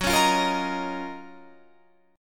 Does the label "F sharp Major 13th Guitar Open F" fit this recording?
Yes